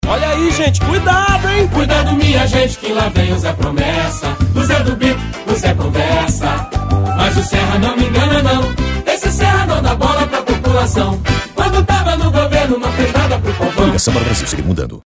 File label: JINGLE